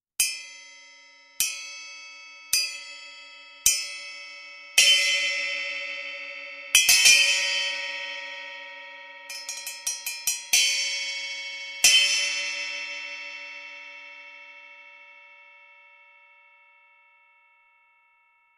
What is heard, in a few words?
Voodoo 7 5 Inch Bell